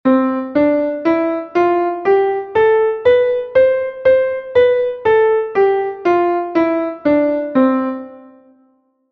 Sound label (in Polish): gama portato